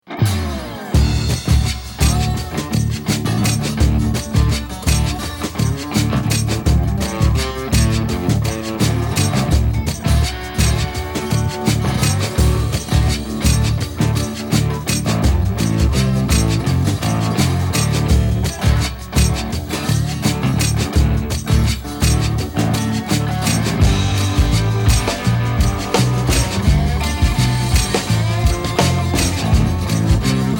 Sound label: Accordion